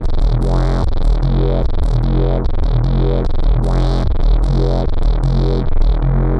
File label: Industrial Bass, Drum N Bass; Sonic Foundry (Sony Creative Software); Knutrix Drum & Bass